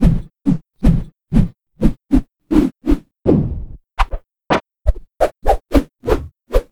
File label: wind noise